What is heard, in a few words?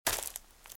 Footsteps Leaves Walk Right; RadwinIsland